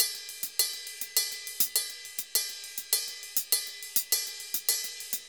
Ride, Festa Latina, Drums & Percussion; Best Service; Festa Latina VOL-1

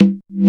CONGA, DRUMS; Analogon

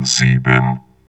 VOX VOX, Electro Vox

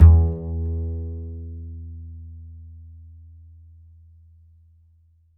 A, upright acoustic bass, A string

